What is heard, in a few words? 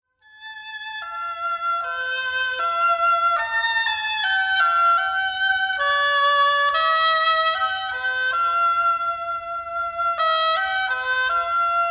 Oboe